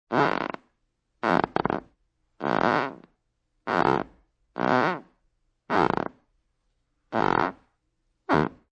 Floor Creaks Carpet Many, Foley, Floor Creaks On Carpet, Many Creaks; Creaking